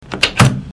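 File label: key; kagi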